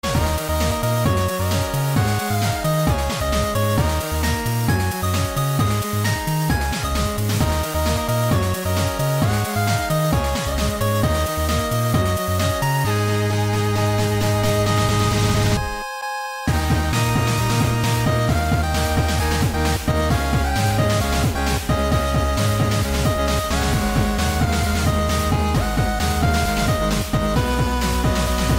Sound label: 8-Bit